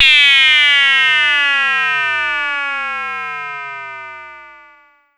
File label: Pollard Syndrum